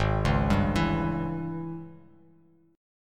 Ab Major 7th Suspended 4th Sharp 5th Ab down Bass Standard; arpeggiated; JGuitar